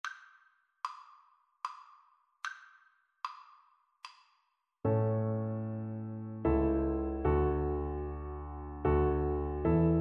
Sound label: Double Bass